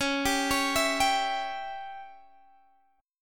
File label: C sharp Diminished Guitar Standard; arpeggiated; chord; JGuitar